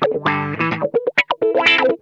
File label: ROCKIN, Funk Guitar; 90 s Sample CDs; Zero G